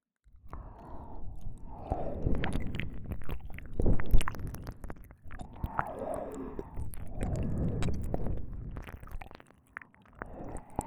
ぐっぽり耳舐め; 乳首オナサポ

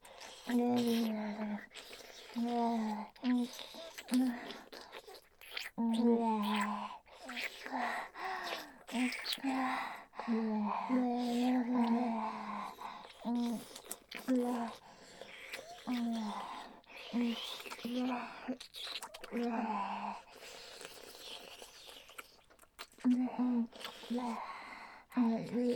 交互舐め上げフェラ(ループ対応)

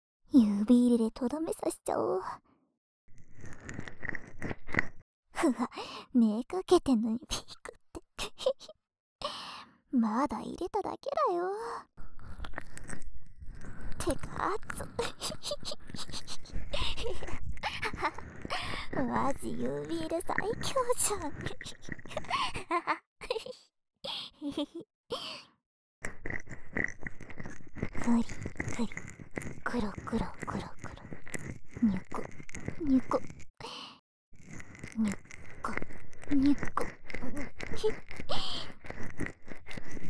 【右】ネイルで奥ぐぽかり, ASMR; 小分け反転ver

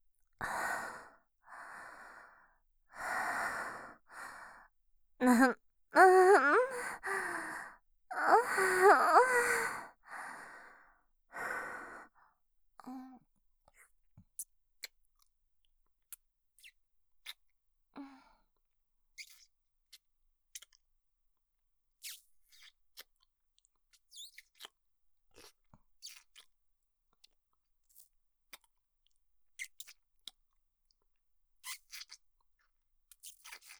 キス; 【マゾハーレム】

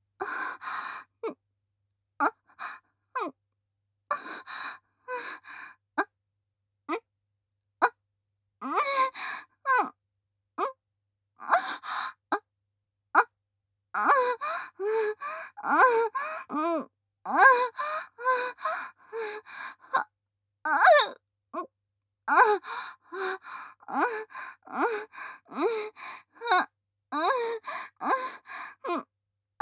喘ぎ声 普通; 淫乱メイド

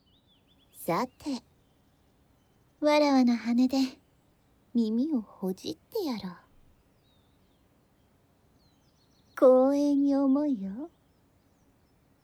羽根耳かき右, ASMR; 妾の羽で耳をほじってやろう; 【羽みみかき】勇者よ、耳かきをしてやる。妾のものになれ～高貴なハーピー魔王 アンドレア～

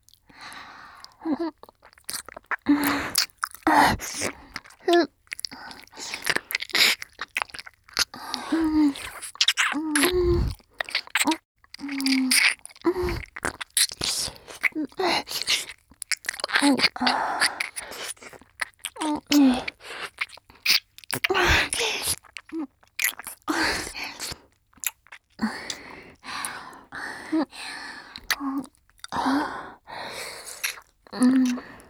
耳舐めのみ 両耳 (左耳 + 右耳); 遊郭で見つけた関西弁の天使おまけ

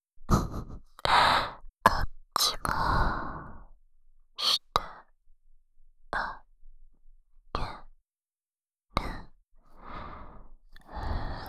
耳舐めパート; 隠れドスケベ淫乱シスター

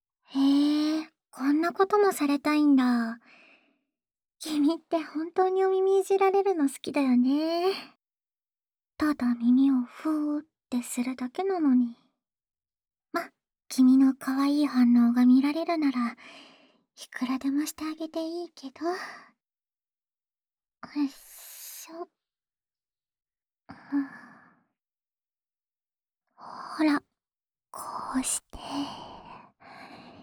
安眠用耳ふーふー 左, ASMR; 青春べろちゅー委員会

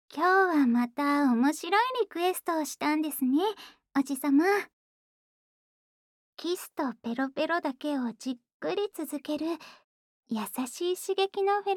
安眠 用 フェラチオ; JKフェラチオ! ぬくぬくとろけるスローフェラが得意なノノカさん; フェラチオループトラック

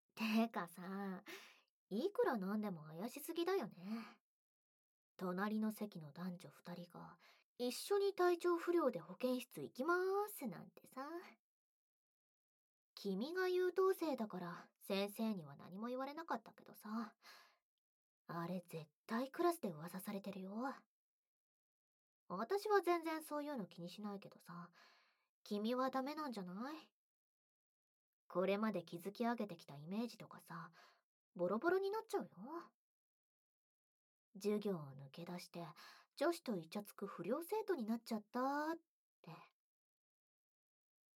ディープキス、耳舐め, ASMR; 騎乗位、生中出し; 小悪魔ギャル

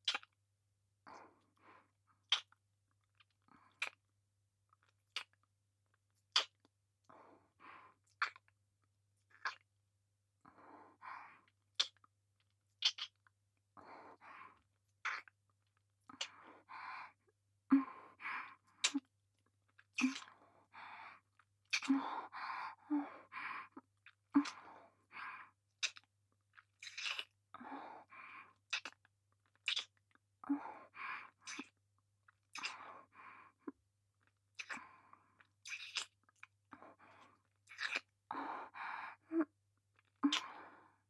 キス; ハナ

